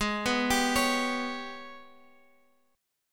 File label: Ab Minor Major 7th Double Flat 5th, Guitar, Open F; AbmM7bb5; arpeggiated; chordshape; 20,18,19,20,x,x